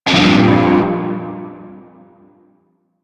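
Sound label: Shawzin Minor Chord J; Music; Warframe; Narmer Shawzin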